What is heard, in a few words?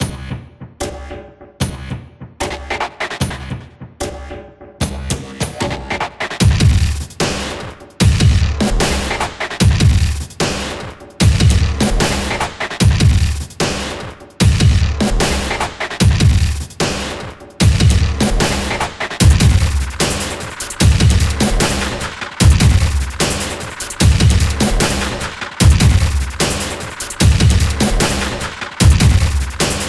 Percussion Loop; Dual Blade: Battle of the Female Ninja